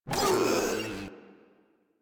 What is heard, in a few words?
Proto Infested Runner Pain; Warframe